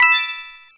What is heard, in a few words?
Coin Battle Coin Total